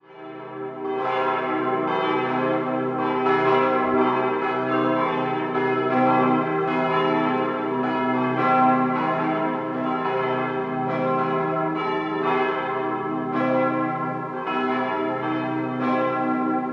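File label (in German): Geläute nach Umstellung auf Kröpfung mit Fallklöppeln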